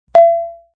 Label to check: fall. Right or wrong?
Wrong